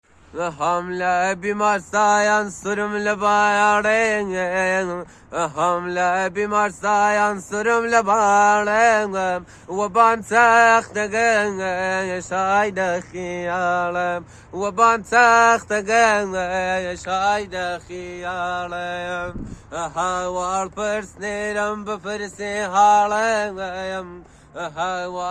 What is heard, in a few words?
kordi live voice